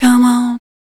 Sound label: FEMALE, Dance Vocals